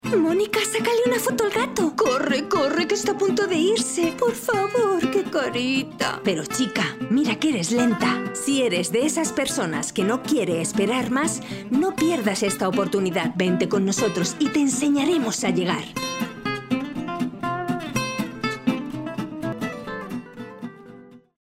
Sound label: radio jingle